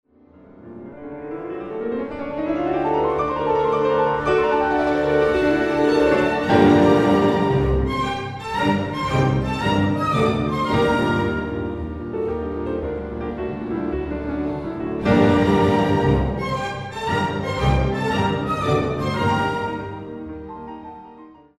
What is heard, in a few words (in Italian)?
Concerto per pianoforte e orchestra in sol minore Allegro non troppo; Roma Tre